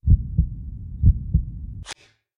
Drawcard; RS Games Sounds; Monopoly

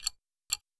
Web Page Loading In Progress; business scheme beta 2

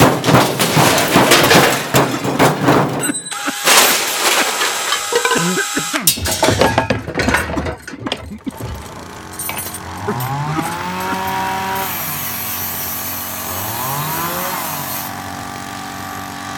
locker placing; Original TK Sounds

